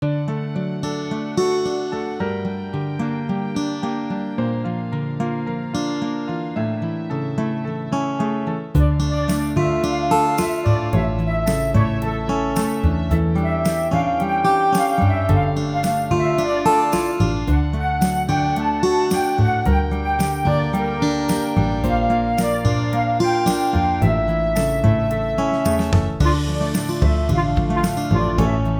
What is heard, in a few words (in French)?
chant pardon seigneur instrumental